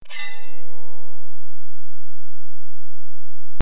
Swords Sing

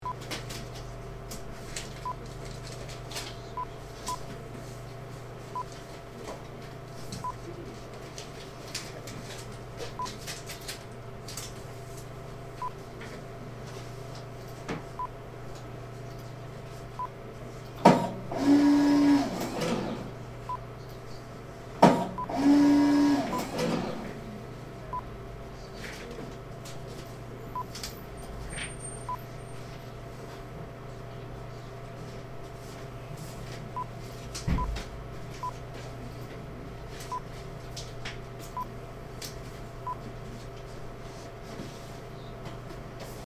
Small Office Ambience

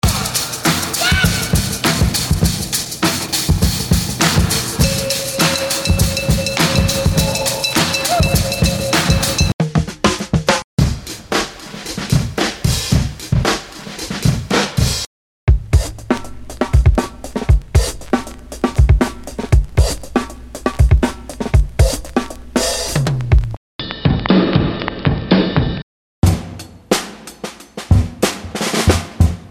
Vynil Drums; HexLoops

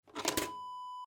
vcr tape remove

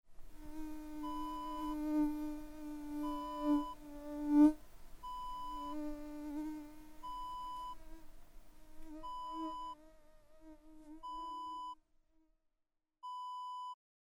mosquito buzzing

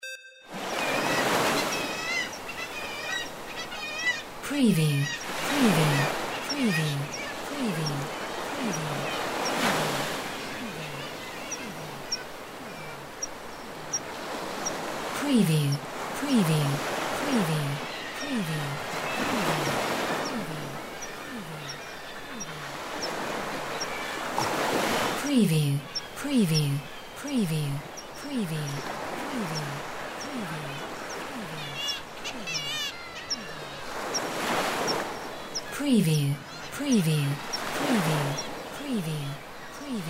AMB BIRDS KITTIWAKE SEACLIFF

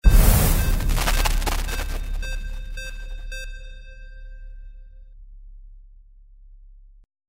sci fi magic heal start; windy beach audio

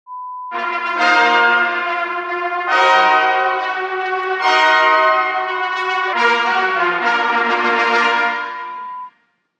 Trumpet fanfare sound

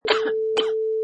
Short Cough

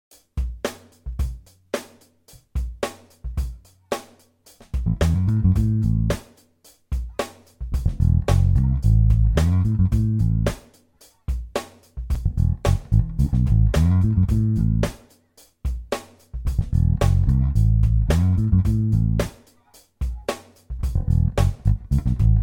A major groove

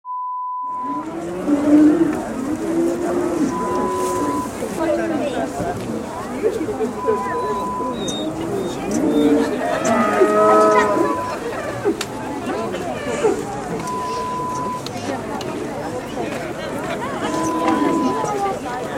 County Show sound

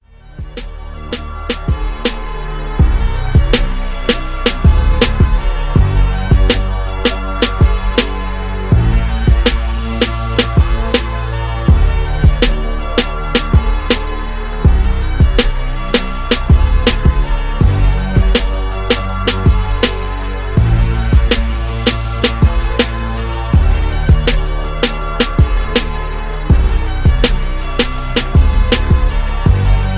Instrumental

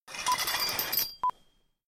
Drawing Curtains

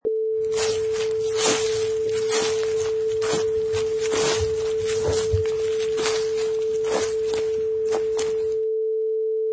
Raking Leaves

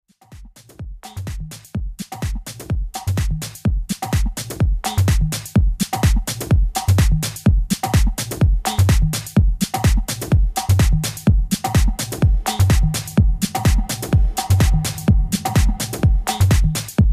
126 BPM

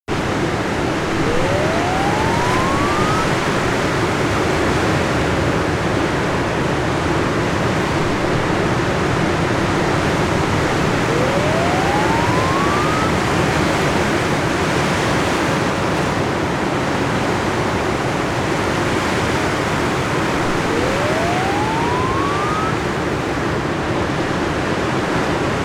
Waves and Wind on Beach

